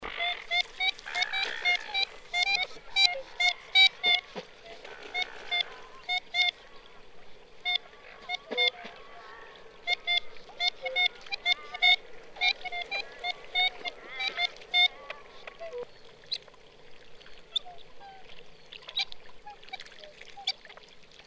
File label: Red necked Avocet